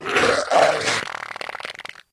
headless, gore; npc; Left 4 Dead 2; Source Engine; infected

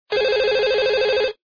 phone ringing loop; Source Engine; de deadblock

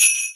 xmas sleigh bells

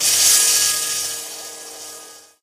lightbridge elec, footsteps

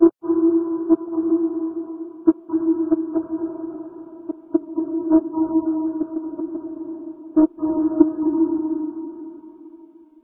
citadel 5 sirens; ambient; source engine; episodic

